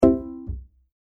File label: chat notification